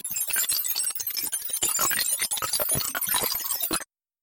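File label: electronic sounds of data transmission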